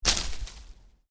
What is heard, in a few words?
Foliage Impact, Medium Foliage Hit; Impacts; Miscellaneous Impact